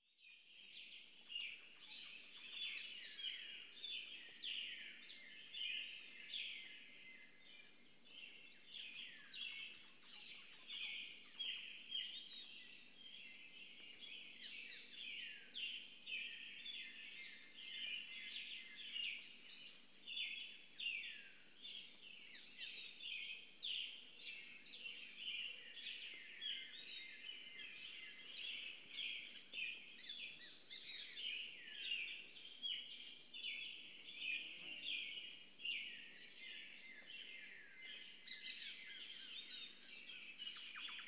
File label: South American Morning; Animals and Birds; BBC Sound Effects Library